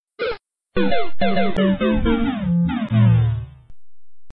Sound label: gameover; Mario